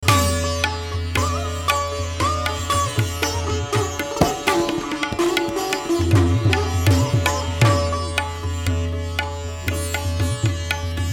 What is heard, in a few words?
PANCHAM SAWARI Bhairavi